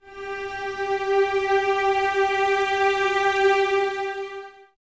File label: G 4, Cellos Expressivo; Miroslav Vitous; String Ensembles